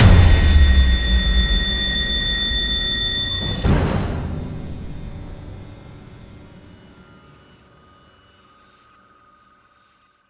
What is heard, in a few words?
Ambient Sting